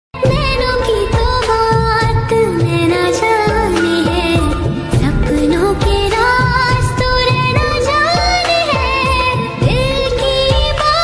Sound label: female unplugged ringtone